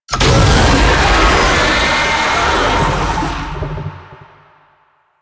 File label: Infested Oni Vocal Shield Up; Warframe